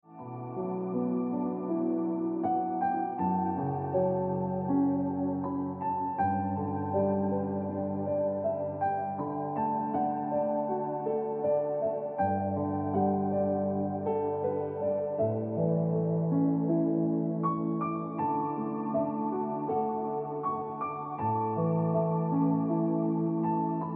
Ringtone Piano Music

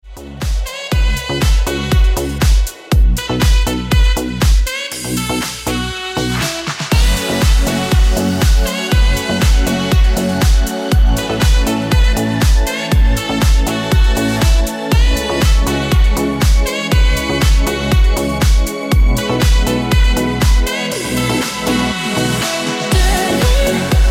Ringtone

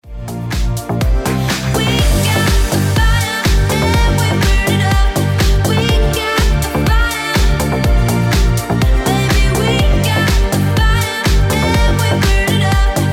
Ringtone Deep House Music

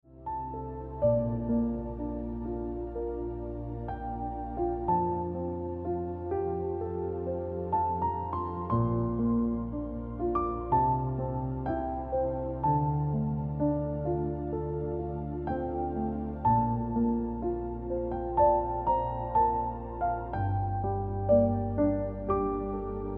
Ringtone Piano Music

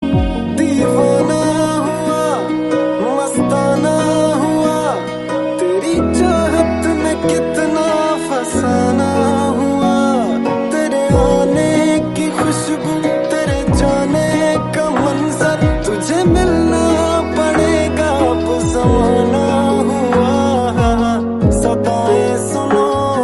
Hindi Old Song Ringtone

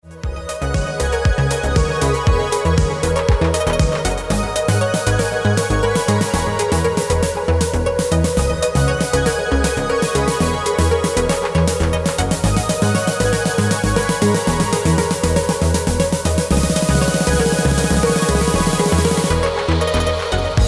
Ringtone

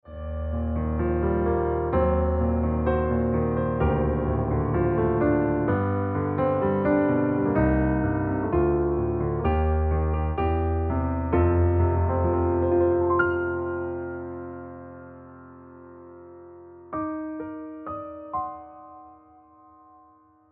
Piano Music

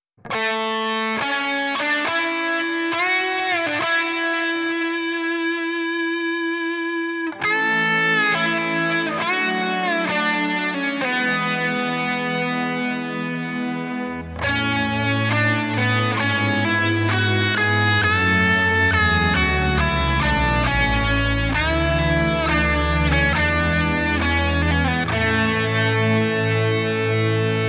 National Anthem, Pakistani

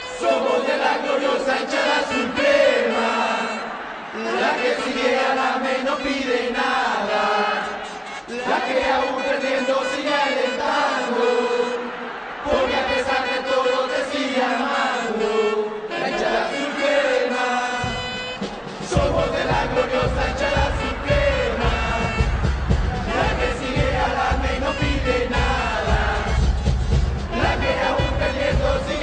Cheers Mexican Ringtone

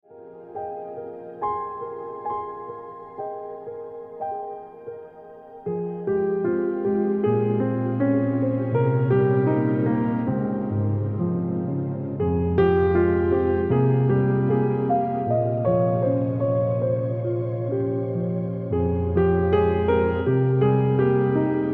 Piano Music

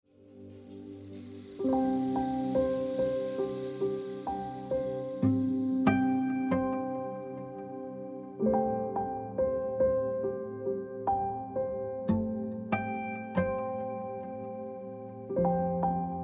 Ringtone

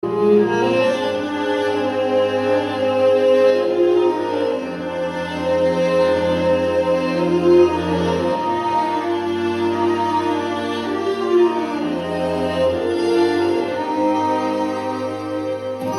Turkey Ringtone

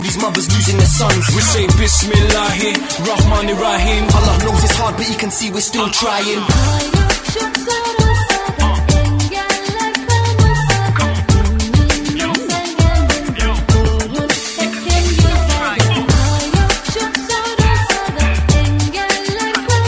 Turkish Turkey Ringtone

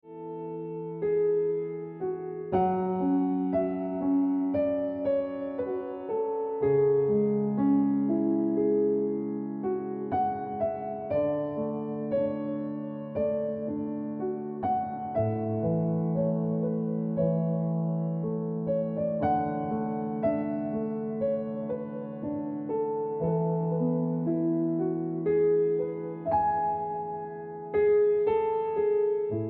Piano Music

